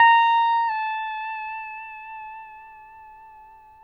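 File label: pedal steel bend down A#5, Right; PEDSTL BENDS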